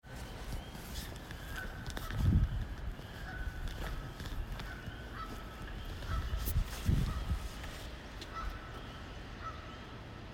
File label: geese